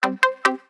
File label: naughty